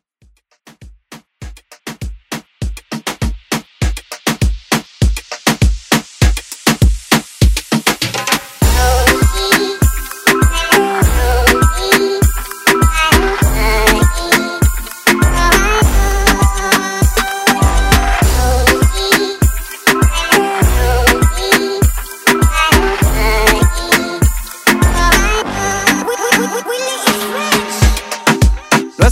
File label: moombahton redrum